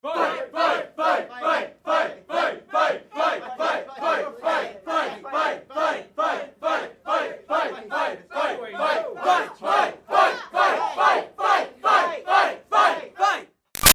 Crowd Teens Chant Fight; Reax; Crowds; Backgrounds; Big Room Sound

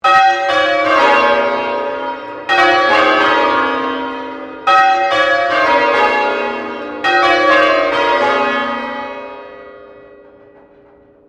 Bell St Pauls Cathedral Melbourne Bells Sequence; The bells of St Pauls in Melbourne; Musical

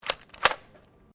GUN TOY DART PISTOL COCKING; Toy Guns (Sound Effects:Toys)

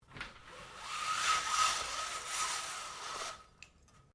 BLINDS VENETIAN LOWER SLOWLY, Household blinds; Living Room

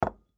Ash Tray Set Down Desk Surface Glass; Objects; Foley; Blastwave

